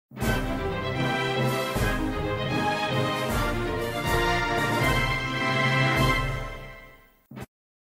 Tema de Victoria; Super Mario; SSBU

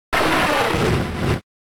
Grito de Magmortar

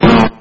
Grito de Snorlax

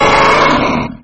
Grito

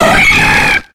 Grito de Heracross

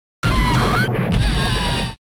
Grito de Heatran

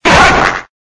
Grito de Sirfetch'd

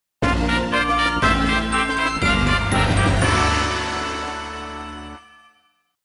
Tema de victoria; Samus; SSB

